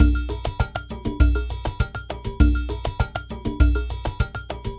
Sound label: Ensemble, Latin Percussion; Sonic Foundry (Sony Creative Software)